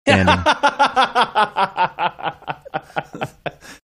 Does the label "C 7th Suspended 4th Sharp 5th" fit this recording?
No